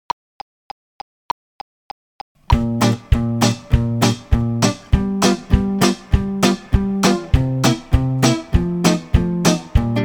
two beat

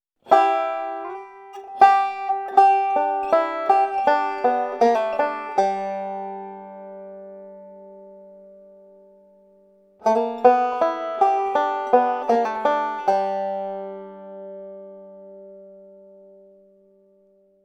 ending licks

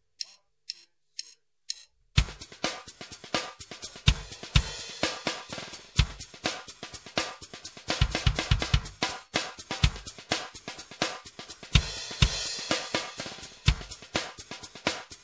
junglesteps

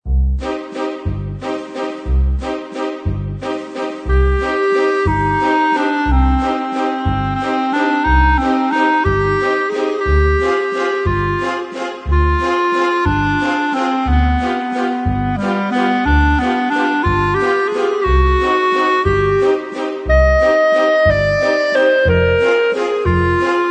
waltz

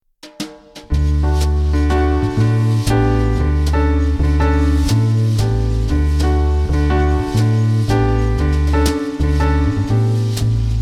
with brushes; silent night

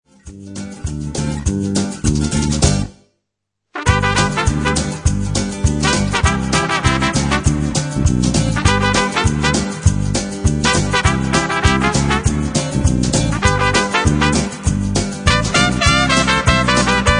mexican shuffle

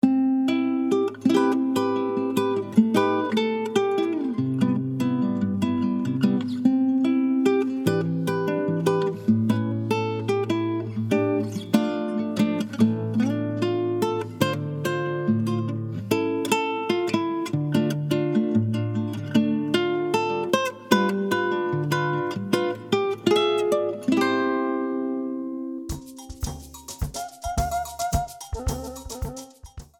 playalong

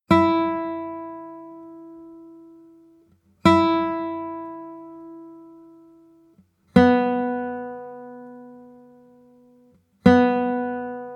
tuning notes

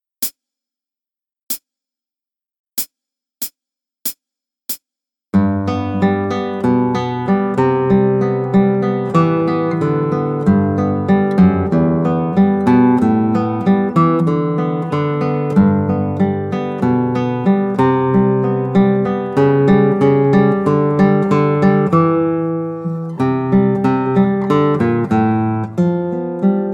rock in the bass

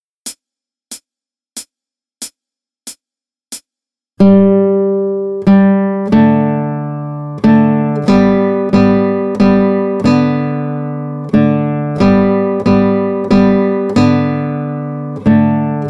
duet

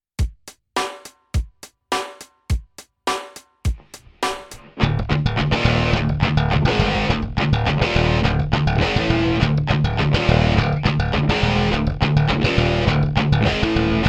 heavy korny rock

